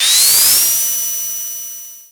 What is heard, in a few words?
spin; sonic-boost; actions